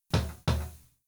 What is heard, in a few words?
knock; ドア・ノック２; 薄めの戸、ガラス戸などをノック